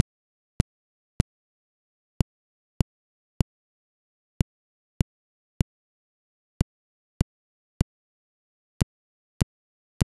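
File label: quartet Ideal impulse; Mauritania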